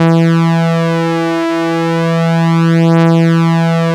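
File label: ARP lead E2; Sonic Reality; Vintage Timetraveler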